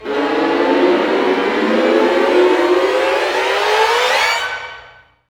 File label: STR SEC, STRING GLISS